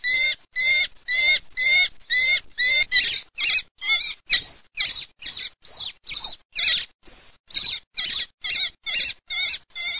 MERLO ALLARME

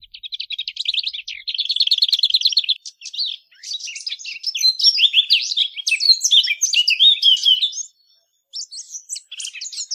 CAPINERA

